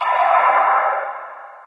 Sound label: inside battle soldier, prison; ambient; source engine; hl2; video games